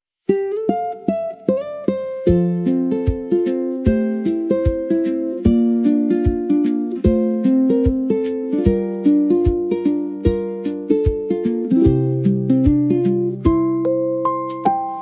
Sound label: Sunlit Ukulele Drift; 烏克麗麗